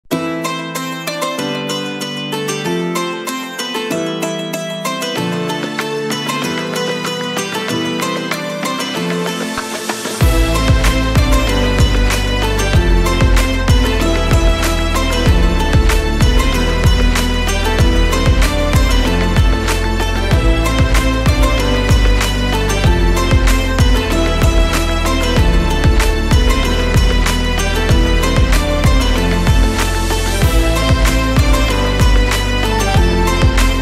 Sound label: Ramadan background music